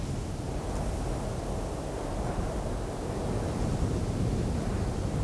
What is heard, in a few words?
wet wind; environment; Tribes2